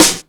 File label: sparkly steel snare drum E key